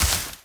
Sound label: land grass